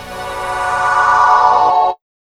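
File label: chord, Electro 125; Big Beat Drumloops; USB Soundscan vol.25; AKAI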